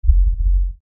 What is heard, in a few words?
Lfe Swell Low Bass Movement; Blastwave